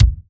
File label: Yamaha RM50 Studio Kick